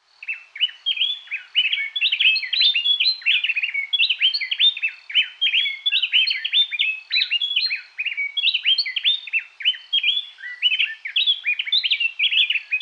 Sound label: Songs and scolds, Birds of the Costa Rican Cloud Forest